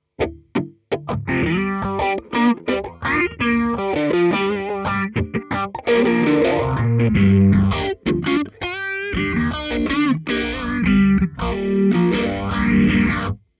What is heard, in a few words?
Lead P90; rock tone; virtual amplifiers